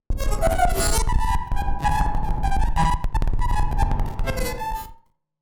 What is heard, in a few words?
Battered Synth; malfunctioning synth samples